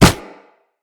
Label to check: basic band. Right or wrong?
Wrong